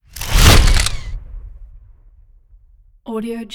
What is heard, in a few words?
camera surreal blasting whoosh by shutter; prosoundfx